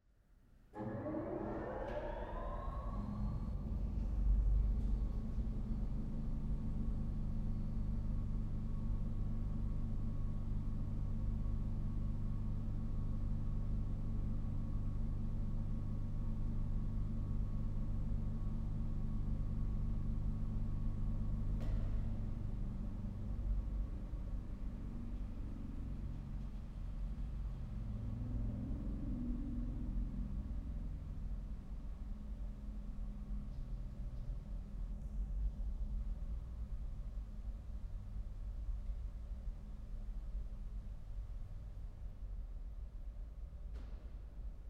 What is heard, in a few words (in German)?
ORGEL Ventus; Aulfingen; VOIT-ORGEL; Laukhuff; 1916